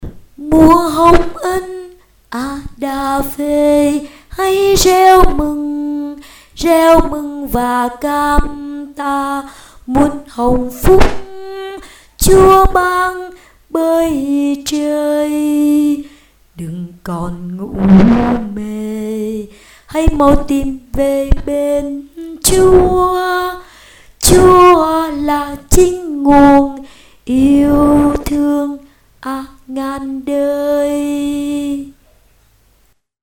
alto

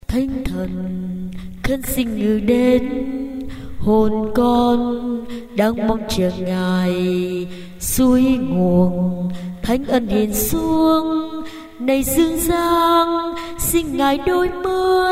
tenor basso

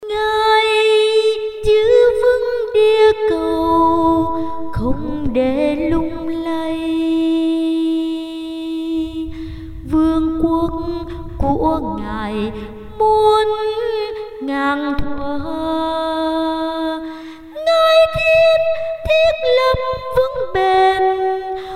tenor

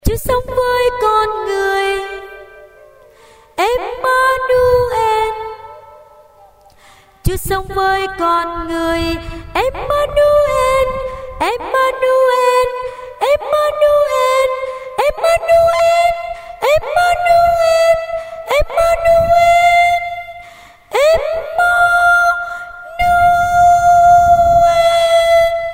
tenor

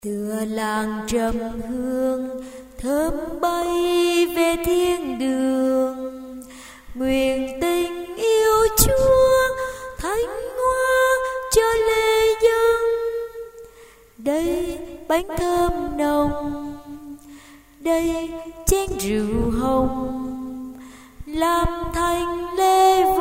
tenor basso

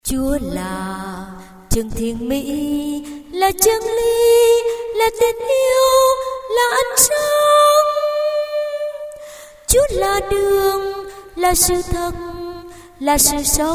tenor basso

